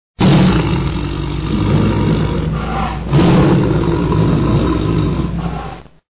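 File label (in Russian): зоопарк северо-восточного тигра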